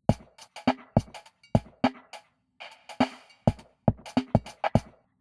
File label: V shape drum